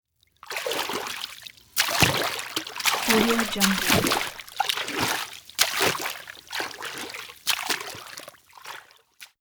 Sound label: swimming fast start arms plunge